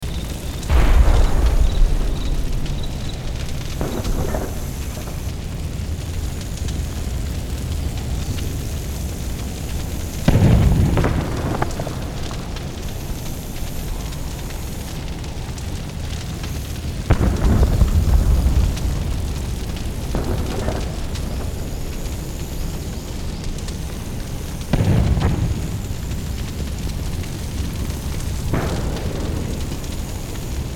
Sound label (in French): boucle détonations de canons nuit lointain incendie